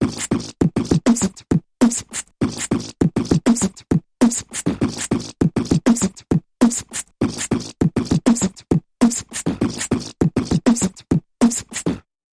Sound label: Big Mouth Percussion Variation